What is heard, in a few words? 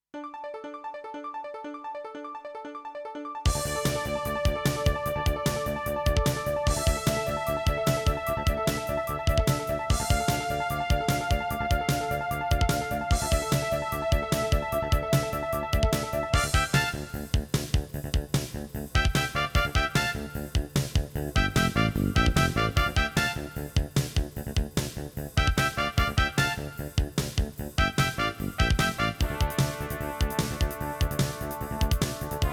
CM500 GM